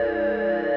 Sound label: TRAK SCAN; lander